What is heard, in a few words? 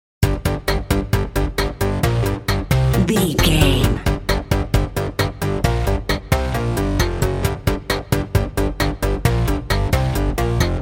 character selection screen video game